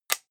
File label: small button generic